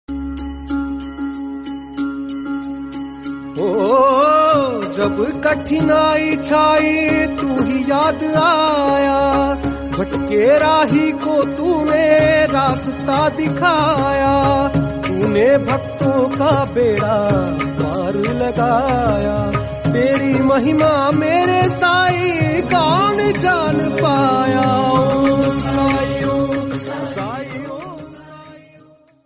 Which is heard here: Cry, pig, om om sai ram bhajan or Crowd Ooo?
om om sai ram bhajan